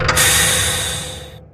outer space transition; Source Engine; tf

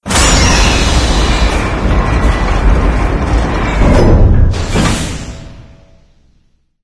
chamber door open

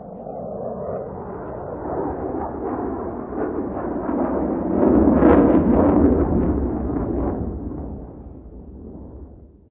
plane, ambient overhead; Left 4 Dead 2; Source Engine

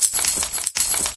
dispenser bullet chain; tf

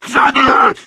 charger lurk, voice idle

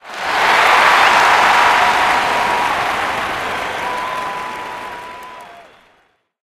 bumper car cheer; Source Engine; tf

